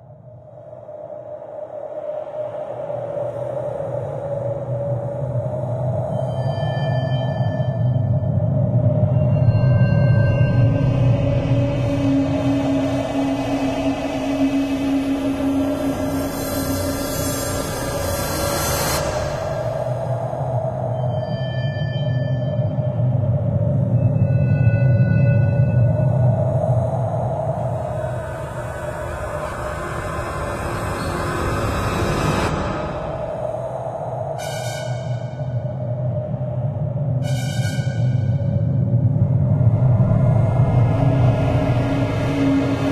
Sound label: Dungeon Lost Corridor Ambience; Animus Stand Alone